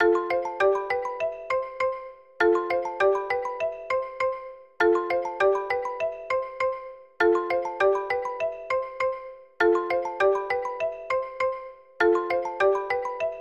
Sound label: call sound